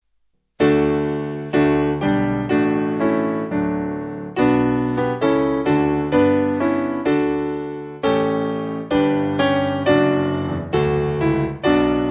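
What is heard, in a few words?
Piano, Music Backing Files for Churches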